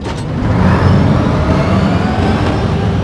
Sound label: predator start; gdi